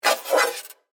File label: Electronic Element, Scifi, Electronic, Element, Alien, Computer, Readout, Science, Fiction